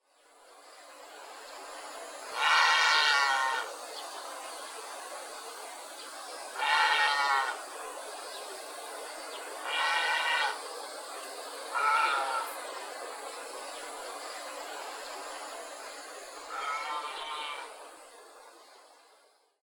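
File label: aosagi, Grey Heron; saitama; koe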